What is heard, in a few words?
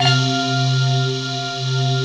METALITES, pads; Pro-Rec - Synthsonic